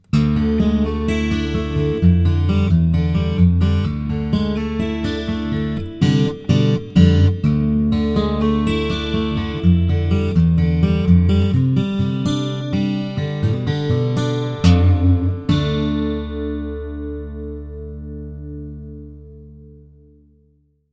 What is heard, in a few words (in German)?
Fingers chorus reverb, Westerngitarre mit Tonabnehmer; FGC-TA; C-TA VT Vintage Tint Transacoustic